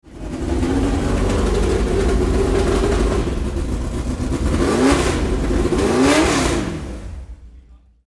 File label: Ford GT40